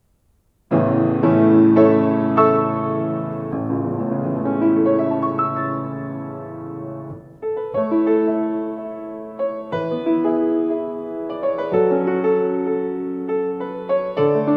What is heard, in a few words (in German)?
Fluegel Yamaha G2